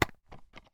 dvd case takeout

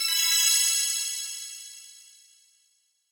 decision, button

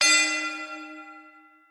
gong played

